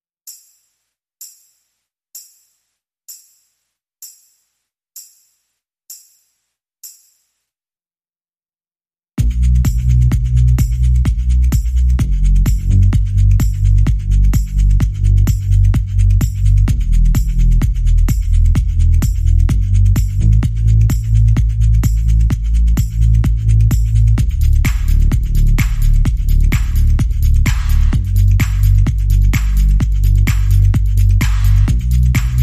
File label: Heading Home Drum and Bass